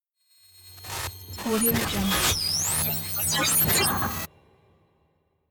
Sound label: glitch